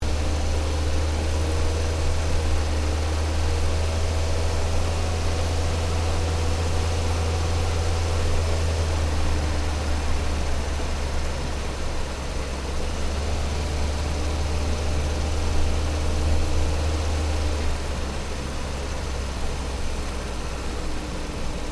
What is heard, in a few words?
Car Ford Focus Drive Engine Bay; Ford Focus hire car; Cars (Sound Effects:Vehicles)